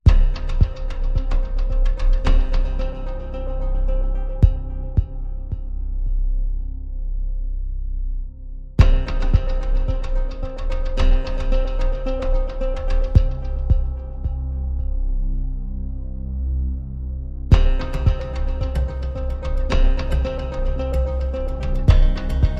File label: Pads and Pulses Only